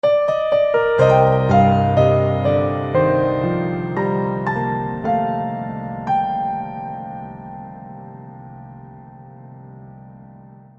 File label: Game Over; Eternal Edge; Hero